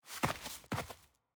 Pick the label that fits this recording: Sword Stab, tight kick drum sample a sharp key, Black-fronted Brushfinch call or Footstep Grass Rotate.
Footstep Grass Rotate